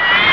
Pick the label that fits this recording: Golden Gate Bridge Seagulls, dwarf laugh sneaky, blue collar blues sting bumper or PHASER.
PHASER